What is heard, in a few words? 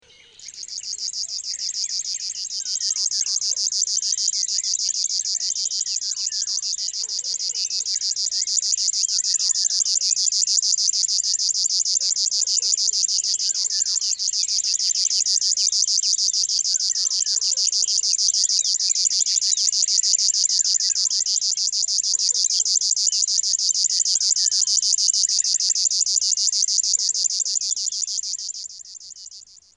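River warbler; Madarak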